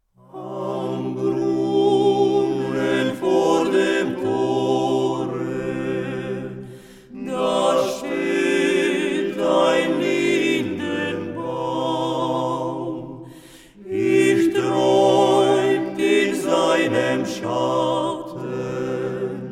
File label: acappella; Brunnen